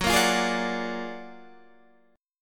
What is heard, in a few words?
Gb Augmented Major 9th Guitar Standard; Gb+M9 strummed; JGuitar